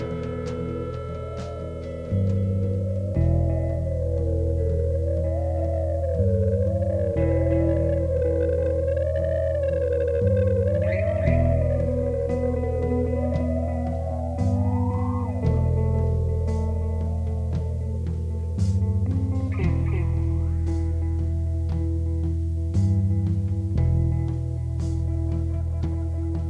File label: Dirge